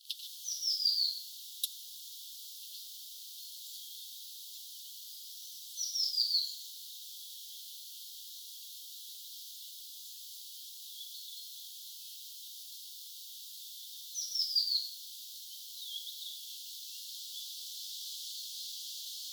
laulava sinitiainen; tuolla tavoin laulava sinitiainen

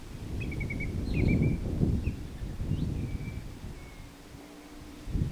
itainen punajalkaviklo

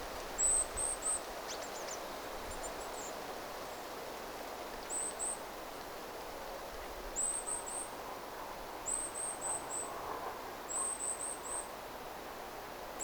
ilm hippiaisen aantelya kahden hippiaislinnun

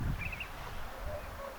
tuollainen tyllilinnun aani

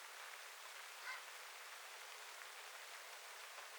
tietaakseni jalohaikaran aani

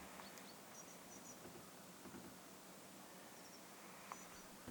rautiainen tietaakseni

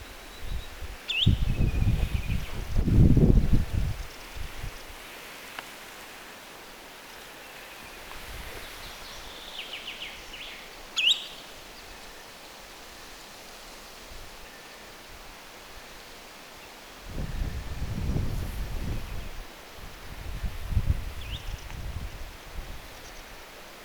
viklo lentaa ylitse

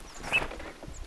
punatulkkulinnun aani

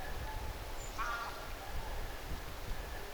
voisiko tuo olla taigakirvinen

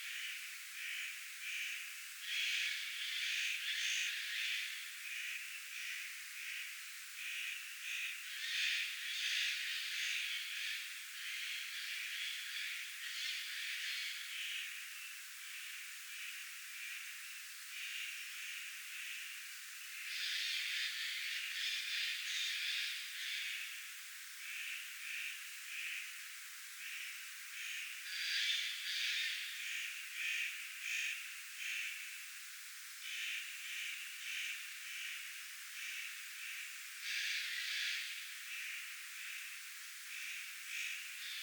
pahkinahakki ja narhi raakkuvat yhta aikaa

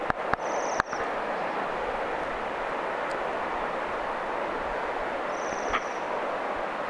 tilhi saaressa

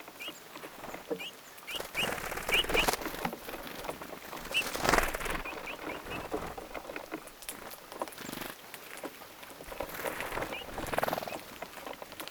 ilm punatulkkuja lintulaudalla aantelya; punatulkkulintuja ruokailemassa?

